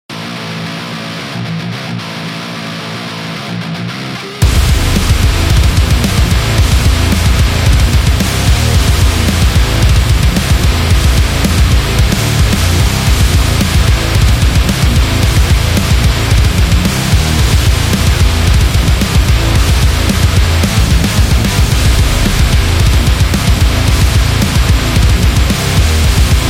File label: Metal